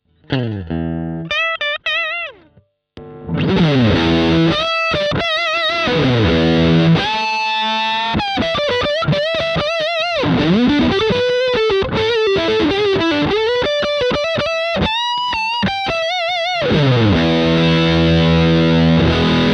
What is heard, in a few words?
BM20 ULTRA Gain max LEAD